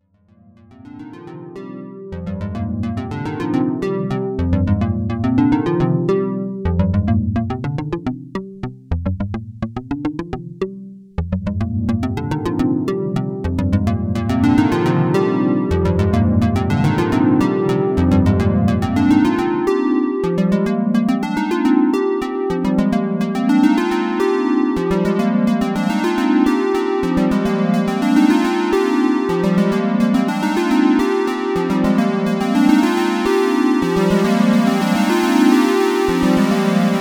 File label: Juno-106 Restored